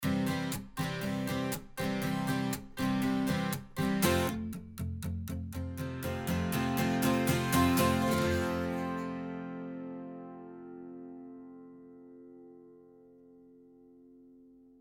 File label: Strummed